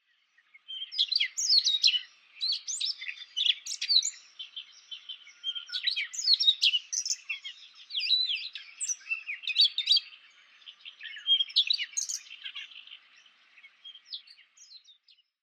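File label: Siberian rubythroat, watarase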